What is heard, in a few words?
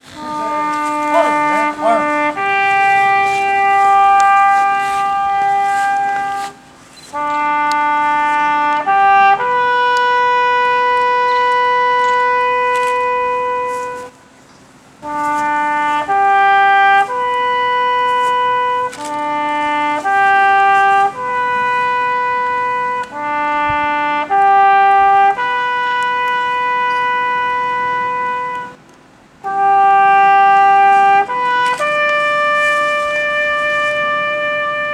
Taps; Memorial Day